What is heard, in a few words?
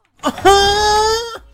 the moan